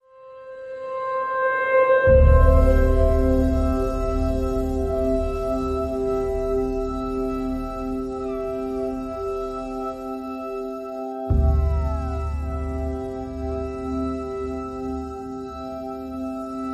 Percussion and Synths

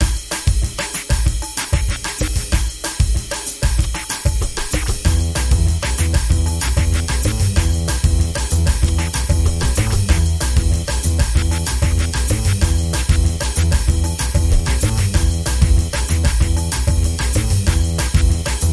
Scratchin Rhythm

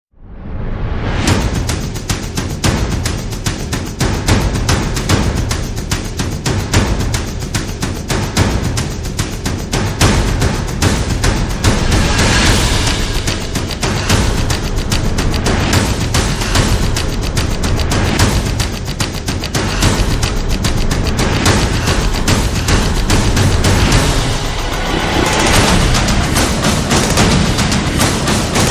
Drums

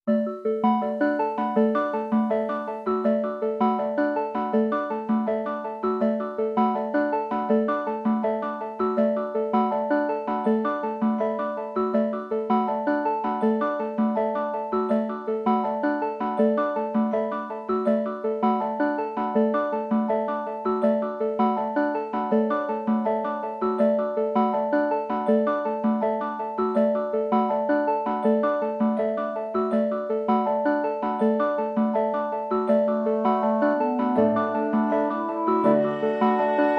No Acoustic Guitars Mix

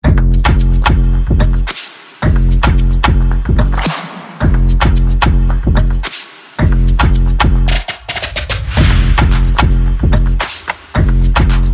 No Brass

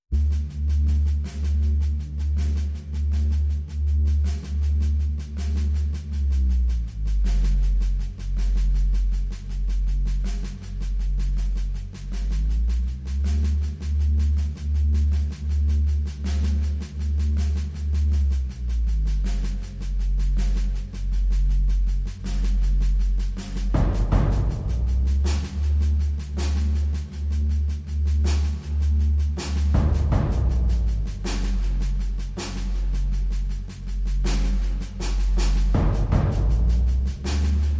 Drums and Bass Mix

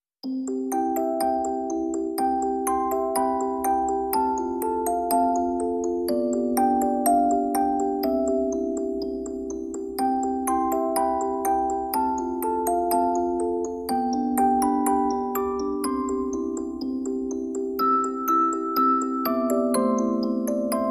Music Box

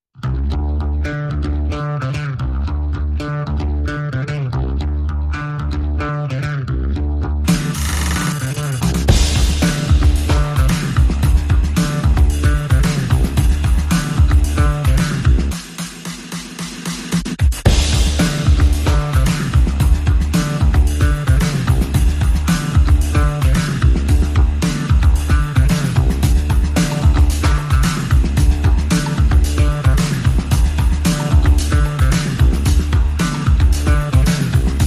Drums and Bass Only

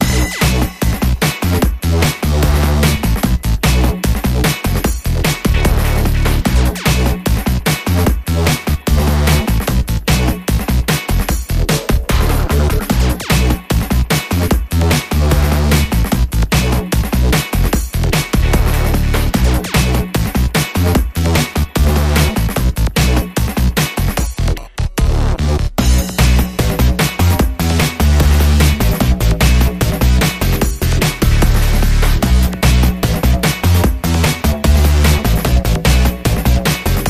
No Vocals No Siren Mix

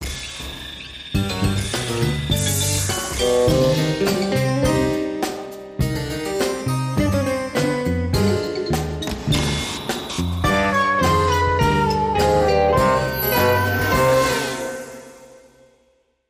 Tango

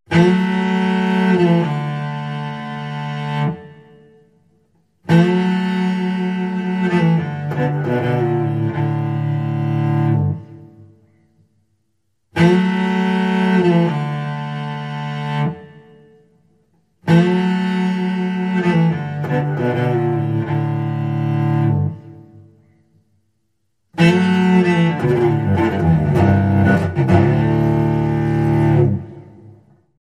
Poor Folks Cello

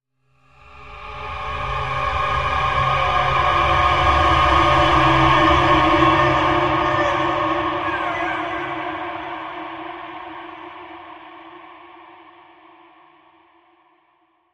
Mermaid Ethereal Transition

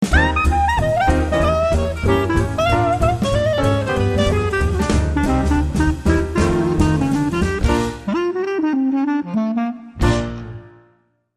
Hot Swing, Jingle

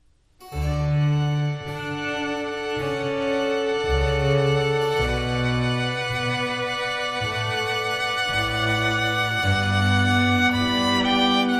Strings Short

